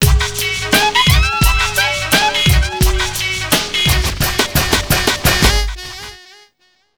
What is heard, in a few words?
Match Lost